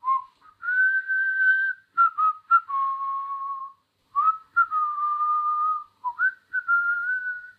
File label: whistle, stalker humming